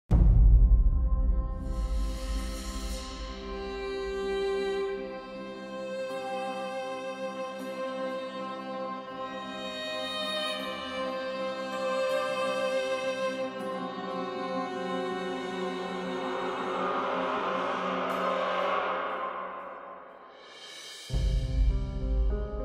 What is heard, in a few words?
Suspense Mystery Music